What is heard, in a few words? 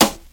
smooth acoustic snare a key; Channels Mono; snare-drum-samples